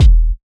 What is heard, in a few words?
kick; Prime Loops Urban Dreamz